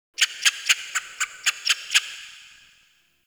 Critter Random; Warframe; Ambience; Nokko Colony

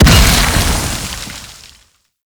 Heavy Hammer Overhead Slam; Warframe; Duviri; Dax; Weapons

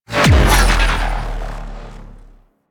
Space Fighter Elite Laser Warp; Space Fighter Laser Agent

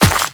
Run Dirt, Footsteps; Warframe; The New War; Characters; Kahl

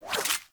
Serpent Ability Tendril Retract; Warframe; Warframes; Alchemist

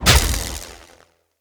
Voltaic Sword Projectile Impact; Characters; Enemy; Abilities; Sinecure; Banneret

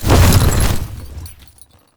Horse Glide Wings Retract; Warframe; Duviri Horse; Vehicles

